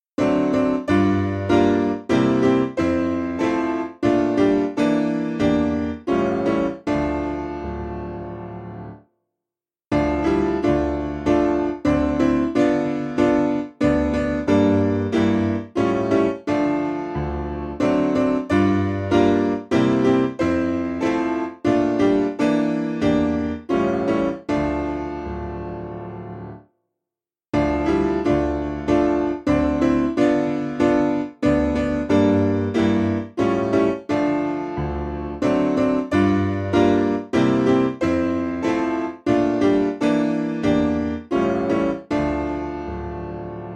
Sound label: Piano